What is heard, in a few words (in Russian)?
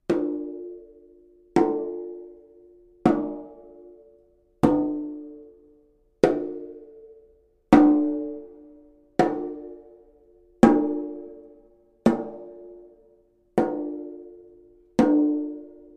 buben